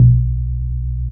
Mid 303, baesse

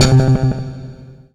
FX Bass

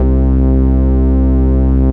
Funk Bass

